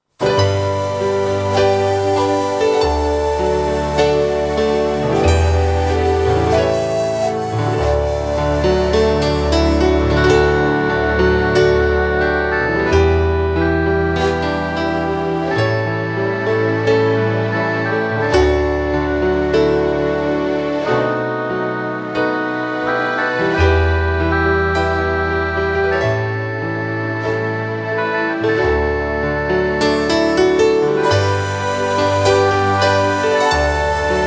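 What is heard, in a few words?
backing track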